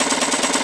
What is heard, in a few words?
slot loop; Tony Hawk's Project 8; XBOX